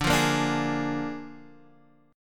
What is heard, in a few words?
Bb 7th D, chord